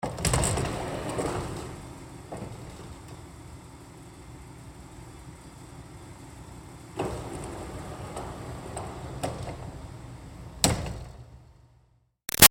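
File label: Door Automatic Sliding Store open-close; Doors; BigRoomSound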